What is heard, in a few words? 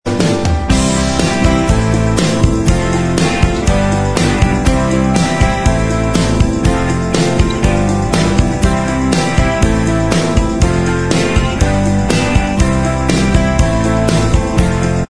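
instrumental, backing tracks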